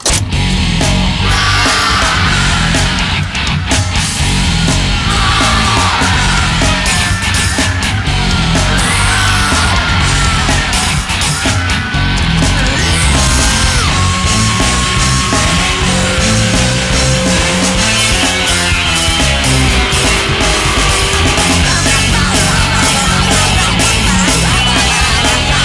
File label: Rock n Roll Music; Rayman Raving Rabbids; PlayStation 2